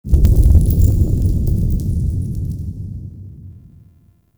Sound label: Interior Cave Deep Boom, Ambience; Warframe; Duviri